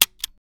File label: Double Barrel Dry Fire, Shotgun; zps; Weapons; Firearms